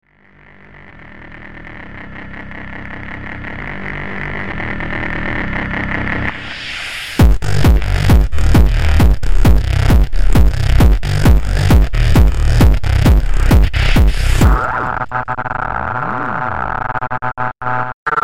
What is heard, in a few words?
Synth SciFi Sounds